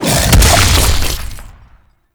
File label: Infested Slam Impact, Enemies; Warframe; Femur